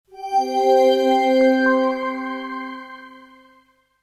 Shutdown, Shutdown, Computer: Short Musical Style Phrase; Miscellaneous Musical; Sound Effects:Musical; Stuart Duffield